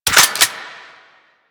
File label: Reload; Gotva Prime; Warframe; weapons